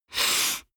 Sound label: nose sniff male hard; koerpergeraeusche; menschen; soundpool